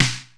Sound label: SNARE, DRUMS; 90 sSampleCDs; Crypton - DrumBase5000